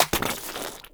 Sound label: Pit Monster Pickup Impact; Warframe; Infested